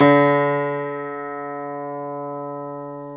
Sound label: PNO, Tack Piano; Club-50 - Foundations Roland